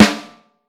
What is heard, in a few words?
snare drum, vintage snare; 6X14; Q Up Arts; Johnny.C's Vintage Snare Drum